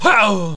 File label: death, car; rally